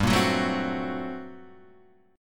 G Minor Major 11th, Guitar, Half Step Down

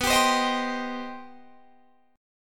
C Minor B; Cm/B strummed

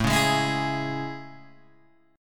A Major 7th A, Guitar, Open G

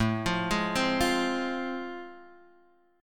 A Minor Major 7th Sharp 5th, Guitar DADGAD

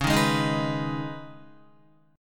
Db 7th Suspended 2nd Db, down, Guitar; strummed; 4E,4A,5D,5G,5C,6F; JGuitar

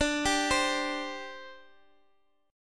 D 7th Suspended 4th D Guitar Open D

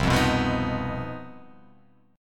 C Minor Major 7th Double Flat 5th chord

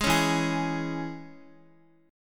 E 6th E, Guitar; strummed; x,x,6,6,4,3

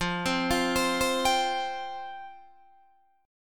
F Suspended 2nd F Guitar Open D

